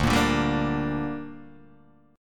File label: D Suspended 4th Sharp 5th D down Guitar Open D, chord